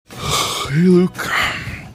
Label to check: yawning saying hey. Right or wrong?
Right